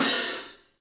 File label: 3D Drums, TIGHT ZILDJ; 3D Sound